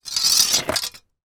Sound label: foley metal strip scrape on brick; zapsplat